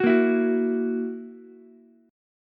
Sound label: E Suspended 2nd Flat 5th E down Ukulele; strummed; JGuitar